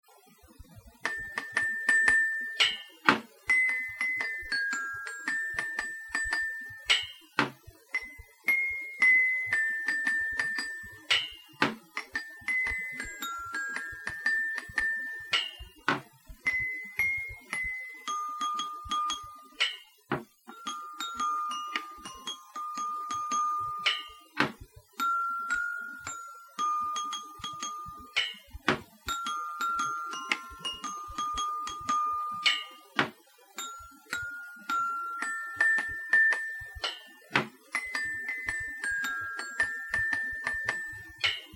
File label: solo xylo